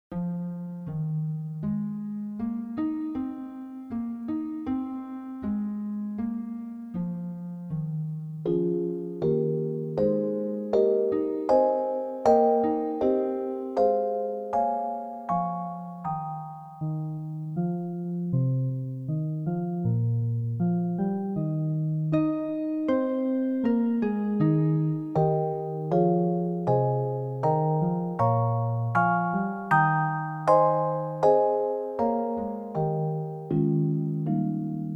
Music Box Orchestra; Pictures at an Exhibition, Promenade, Modest Mussorgsky; The Baby Einstein Music Box Orchestra; Art Time Classics

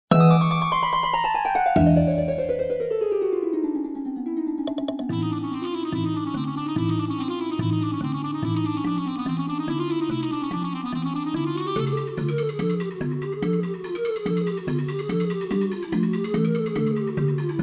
Flight of the Bumble Bee, Music Box; Nicolai Rimsky-Korsakov; The Baby Einstein Music Box Orchestra; Art Time Classics

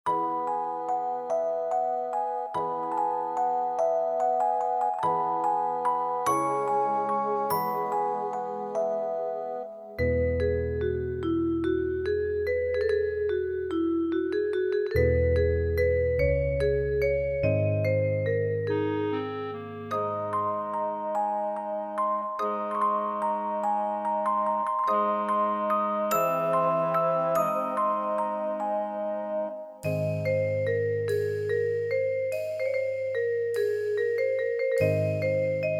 Music Box Orchestra; Peer Gynt Suite, Morning, Edward Grieg; The Baby Einstein Music Box Orchestra; Art Time Classics; Baby Van Gogh

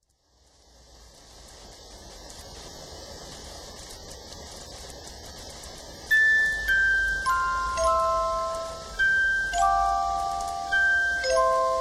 Here comes the rain; Happy Babies Series; Nature

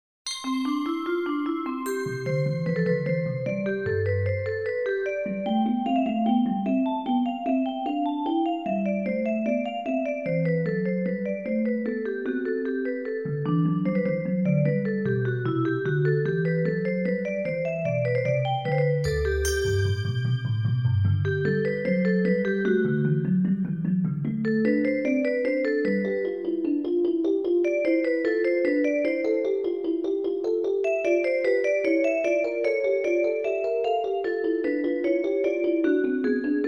2-Part Invention; Bach; Baby Einstein

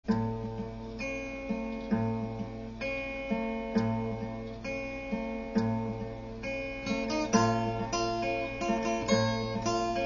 guitar; Gostia iz budushego

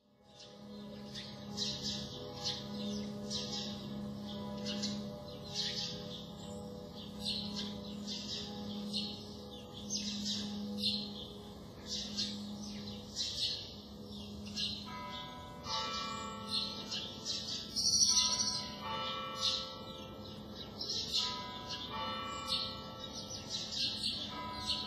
Nature Sunday morning; Happy Babies Series

